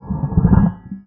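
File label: glissando, special fx; Xsample VOL-1; 90 s Sample CDs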